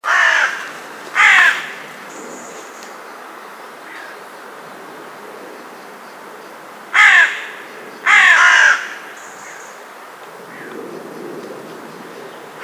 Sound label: Corvus corone; SETE